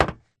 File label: Grunt Mvmt FS Walk Solid Metal; Titanfall 2